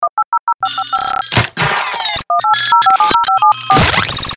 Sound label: Phone Stab; Best Of N2O Library; Stabs & Bursts